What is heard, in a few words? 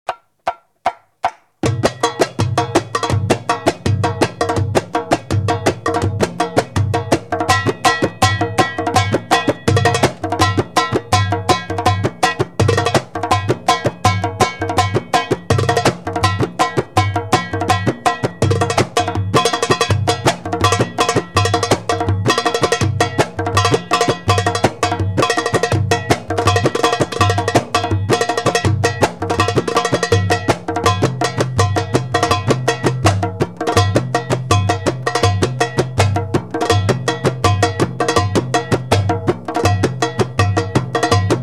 Tabla Solo; داربوکا